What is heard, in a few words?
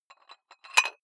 dumbbell put on rattling big weight